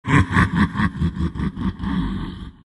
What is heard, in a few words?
laugh; dota; outworld destroyer